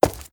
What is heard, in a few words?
Stone Run